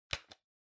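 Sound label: Ceiling Light (remote control Button)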